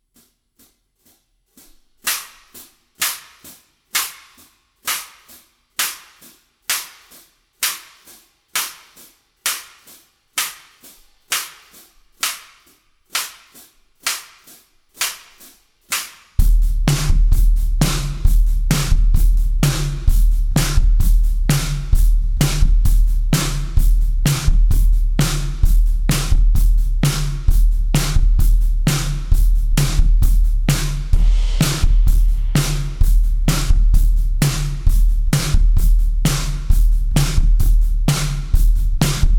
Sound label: drums